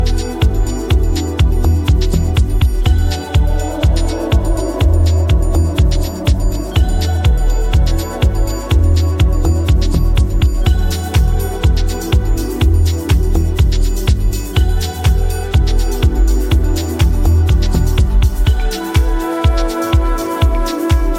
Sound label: Lost Flute; house